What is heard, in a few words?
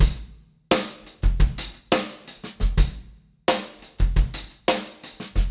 LICK 87BPM DRUM LOOP